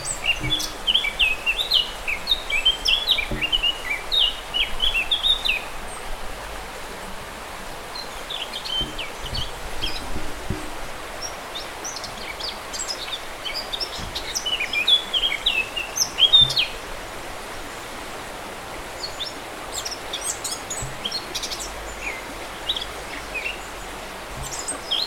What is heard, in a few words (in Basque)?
txinbo kaxkabeltza, Curruca capirotada, Sylvia atricapilla, Canto; Observatorio de aves; TXINBO KAZKABELTZA